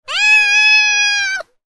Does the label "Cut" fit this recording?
No